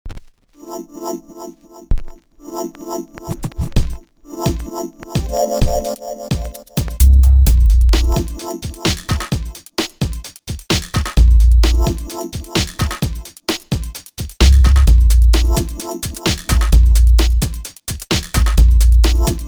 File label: instrumental; binaudio